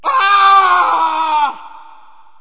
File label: death; alienarena; construct